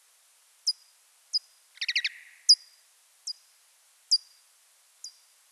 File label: Pipistrellus pipistrellus